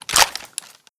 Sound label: mag out, weapons ump45